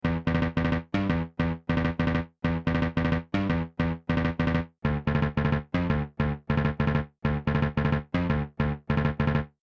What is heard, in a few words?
metal rock